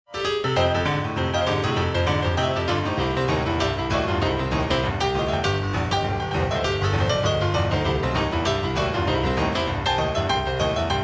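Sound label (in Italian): boogie woogie, blues pianistico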